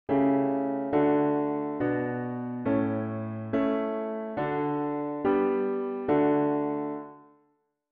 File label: Harm Dict diat inv V7 inv bass